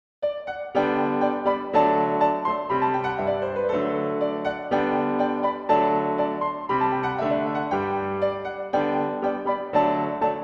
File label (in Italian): minuetto